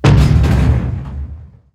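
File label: explosion asteroid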